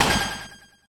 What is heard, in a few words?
grab; Gachitale